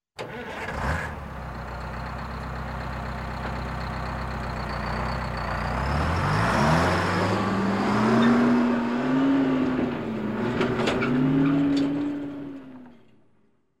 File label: 18 Wheeler Cranks Leaves